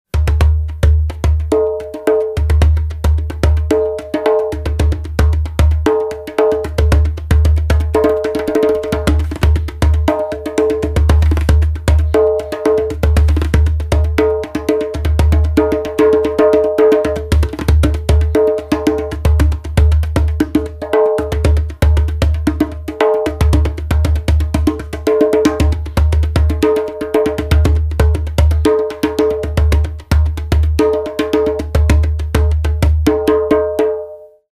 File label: djembe